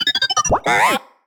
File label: happy, baby; CosmicRage